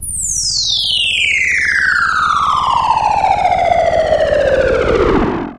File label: Shield; ASUS; P4B533; Intel 845E ATX